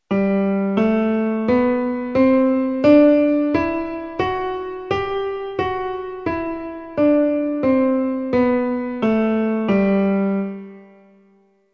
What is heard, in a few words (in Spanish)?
escala sol m grave